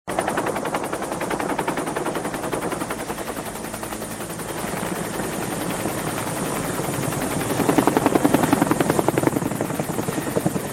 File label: Heli Bell 212 Approach Landing